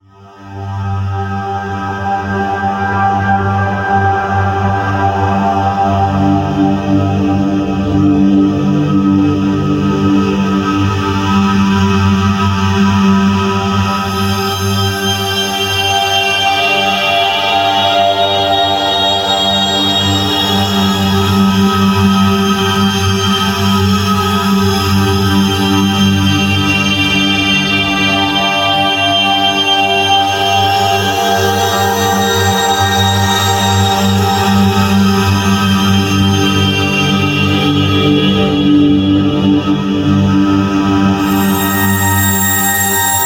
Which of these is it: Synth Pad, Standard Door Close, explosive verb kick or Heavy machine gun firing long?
Synth Pad